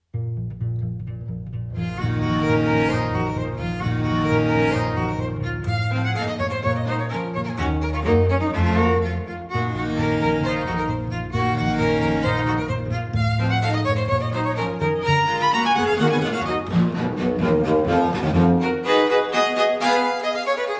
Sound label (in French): quatuor en si mineur final allegro molto